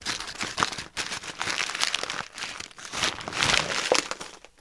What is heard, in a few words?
Crumbli, paper mvmt